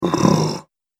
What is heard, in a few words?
Orc Tired, Monster Sound Effects; horror